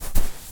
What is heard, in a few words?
footstep sand run